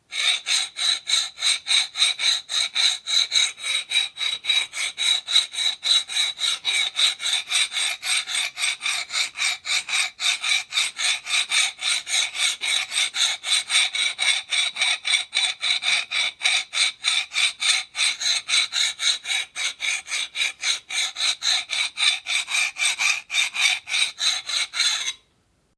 Metal Grater, Hand held grater, repeatedly grating metal; Metal Sound Effects; Materials